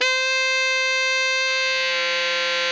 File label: TENOR C 5, Saxophones; Greytsounds; Philip Wolfe David Crozier's Saxophones